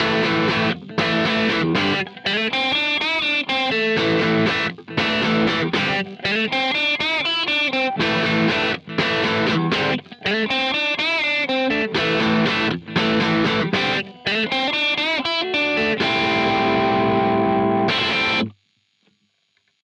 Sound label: Modded Plexi V2